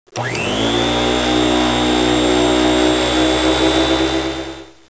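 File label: vacuum; casa; objetos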